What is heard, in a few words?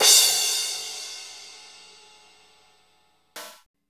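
CYM CRA, crash cymbal; K-Crash st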